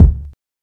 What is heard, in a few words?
short reverb steel kick drum c sharp key